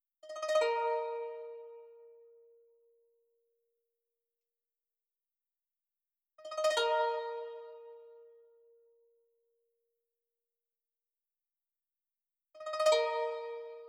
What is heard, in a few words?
156 BPM G# Min Koto; Cymatics; Quest; Destiny Gold Expansion